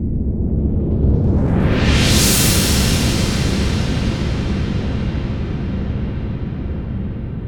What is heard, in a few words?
GONG ROLL; Best Service Advanced Media Trax Modern Composer